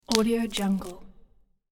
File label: broad bean pod crack; domestic sounds